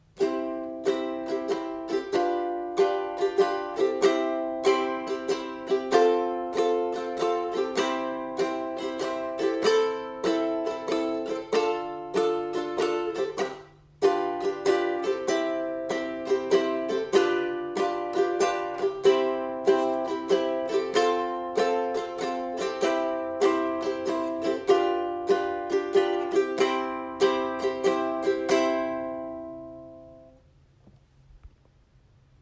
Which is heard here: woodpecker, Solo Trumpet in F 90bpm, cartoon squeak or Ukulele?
Ukulele